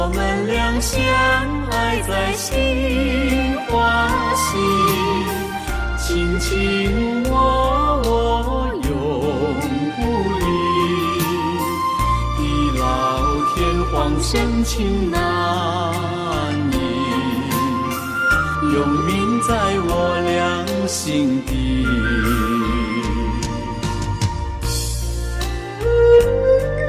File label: Ringtone